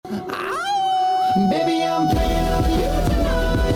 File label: auuu animals